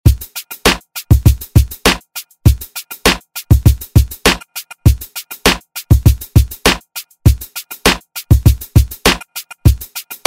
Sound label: Pop Redrum